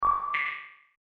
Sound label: Dashboard